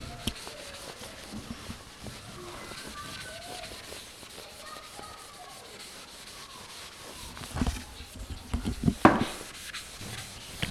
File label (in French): brosse sur le tableau; Les ecoles